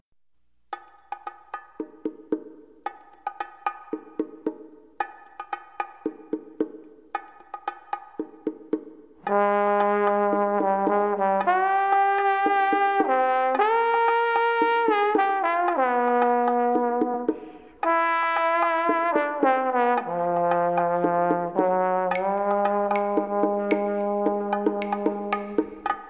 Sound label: jazz orchestra